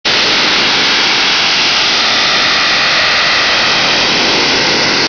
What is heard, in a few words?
DRM